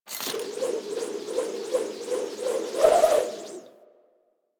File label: Sword And Whip Idle Spin Whoosh; Warframe